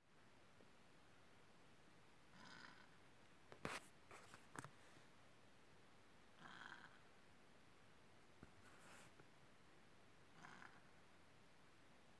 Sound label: Kiwi